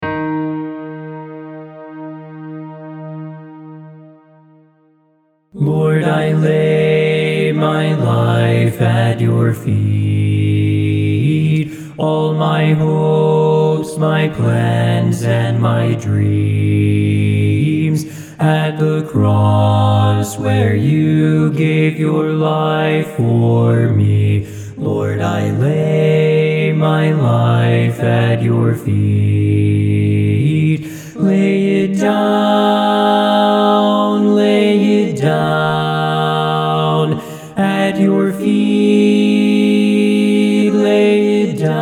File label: BASS